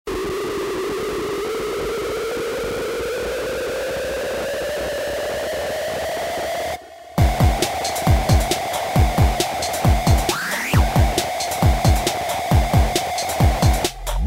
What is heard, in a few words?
Noise FX, Access Virus; VIR2ALL